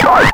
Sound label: bass, fx & sweeps; EZRollers